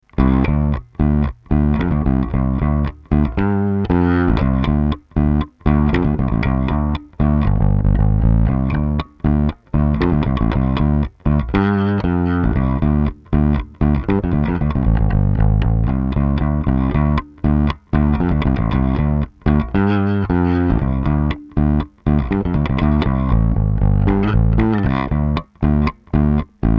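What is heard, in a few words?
Scrambler Finger, bass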